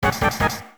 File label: Quiz Start (No Voice); Yuuyu no Quiz de Go Go; Taito F2 System; arcade